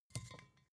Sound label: bottle vodka pick up grab hard; berufe; gastronomie